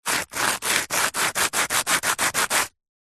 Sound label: industrial sand paper sand plastic rubs